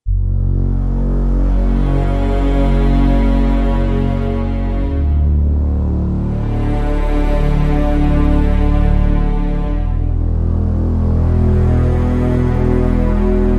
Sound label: Underscore